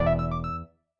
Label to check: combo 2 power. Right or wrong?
Right